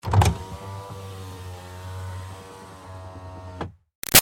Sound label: Car Highlander Power Window Down; 2005 Toyota Highlander V6; Cars; Vehicles; Big Room Sound